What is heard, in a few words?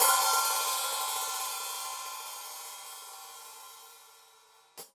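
Sab Open Hat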